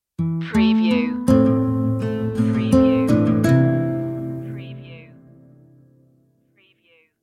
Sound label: stinger